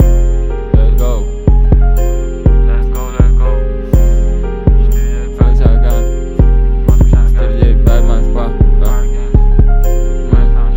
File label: beat freestyle